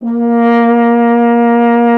F. Horn; Miroslav Vitous; Solo Instruments VOL-2

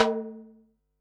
tom, Pearl SC-40 Toms

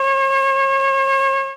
flute F, FLUTES; ProSamples vol.55 - Retro Sampler; ORCHESTRON

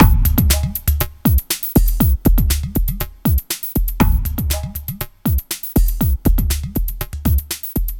Ethnotronic, Processed Percussion

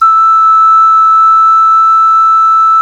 CLAYFLUTE, Heart of Africa; Spectrasonics; Heart of Africa 1

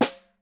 SN, Percussion

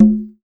D&B Conga; Drum & Bass Kit; essential drumkit

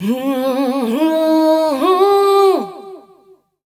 FEMALE, Dance Vocals

